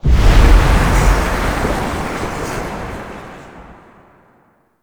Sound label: Diorama Whoosh In; Warframe; Tau; Tennocon Relay